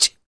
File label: FEM PERC, Vocal Jazz FX; Spectrasonics Vocal Planet